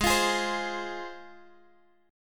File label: Ab 6th Ab, Guitar, Drop D